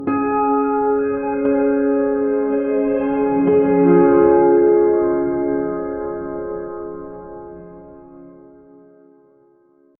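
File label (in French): musique d'ambiance classique; classical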